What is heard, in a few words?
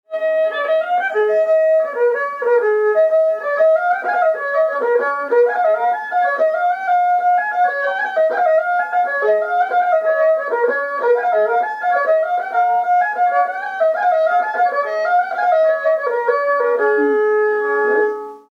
Slip jig